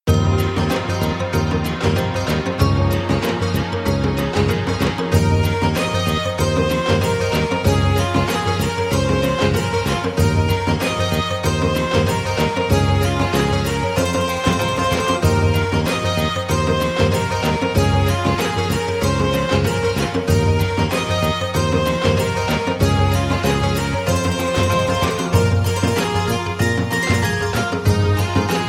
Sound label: Eastern River Tune